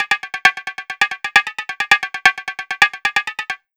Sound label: Ragga & Dub Reggae PERC; USB Soundscan vol.27; AKAI